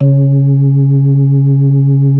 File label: B3 C#4; Best Service; Advanced Media Trax Modern Composer; B3 LES 1